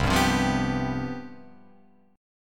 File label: C Major 7th Suspended 4th C down Guitar Open F; CM7sus4 strummed